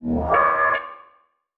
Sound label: Title Transition Logo; Production Element